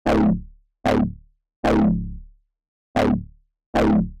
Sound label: Bass hit synpatch